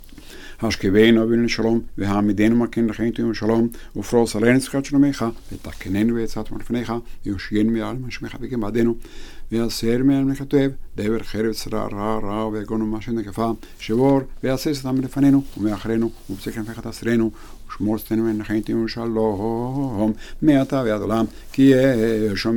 Hashkibenu; Weekday Arbit

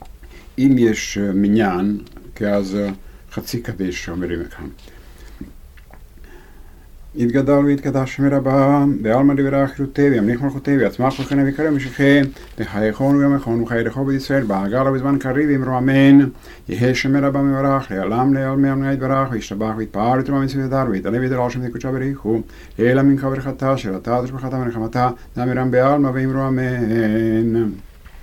Half kaddish; Selihot

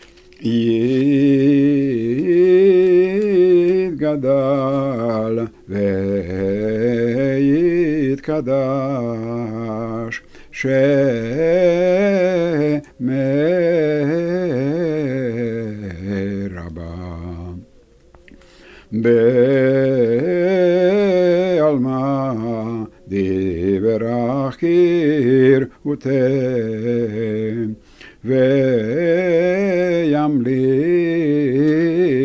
Kaddish opening

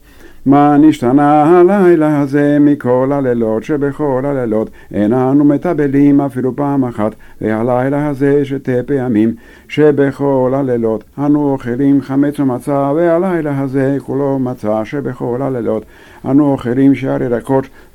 Ma Nishtana Four Questions; Haggadah

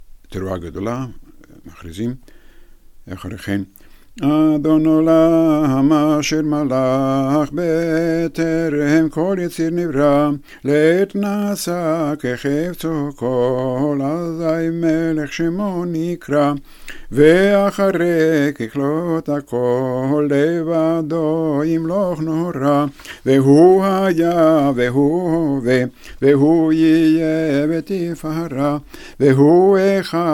Adon olam

